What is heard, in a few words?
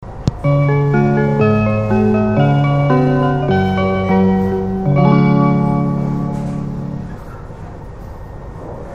Joetsu chime